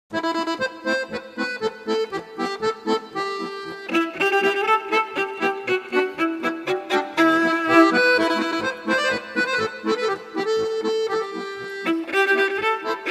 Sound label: Rikud instrumentral